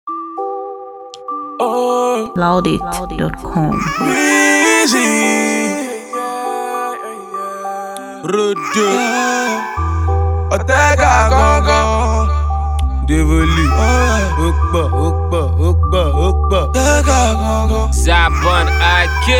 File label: Instrumental; Pray Challenge